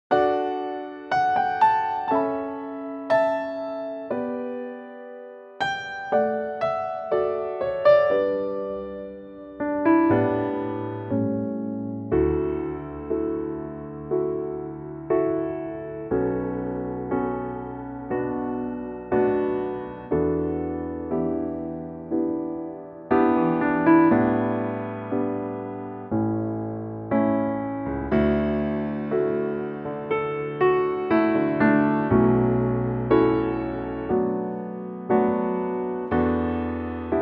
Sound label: piano; Pianotrak; key - D to Eb - range - A to D; Gorgeous piano only arrangement